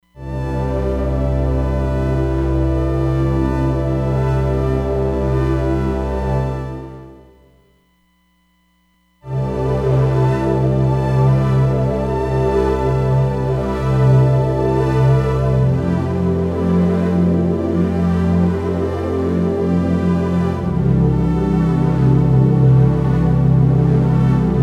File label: Chorus; Roland DEP-5; Factory Patches